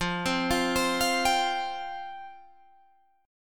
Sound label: F Suspended 2nd F Guitar Drop D